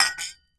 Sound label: Hit Glass Jar Clink; SFX Kit; Foley - Misc